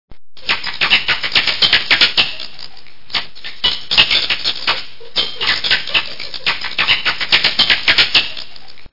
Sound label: bats